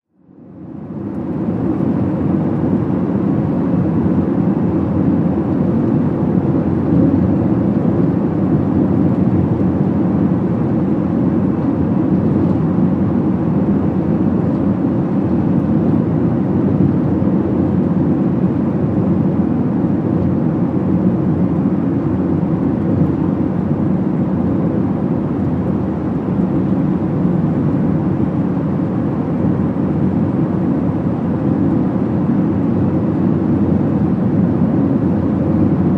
Sm Car Drive 55 65 Mph, compact

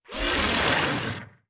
Dinosaur Growls

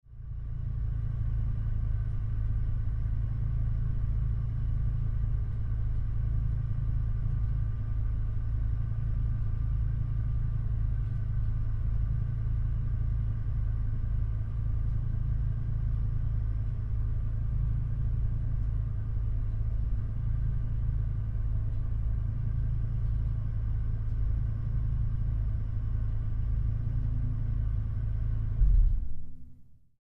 Dodge Ram: On Board Idle.

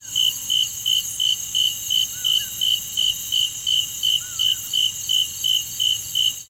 Crix Cicadas Loop Nig

